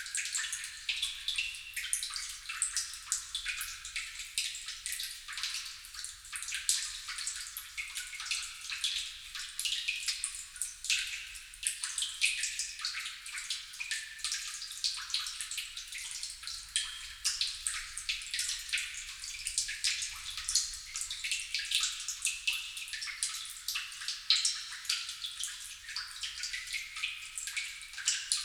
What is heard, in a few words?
water drip cave